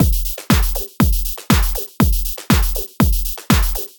Beat 120BPM Low Deep

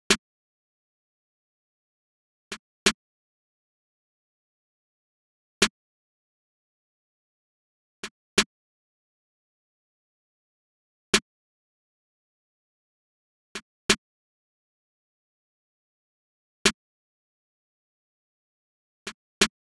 174 C snare

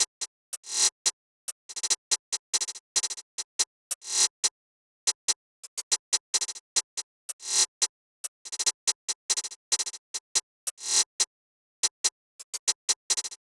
Cm 142bpm HiHat; Drippy Drill Melodies

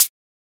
hihat

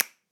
Foley Click Hard

